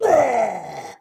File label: soldier death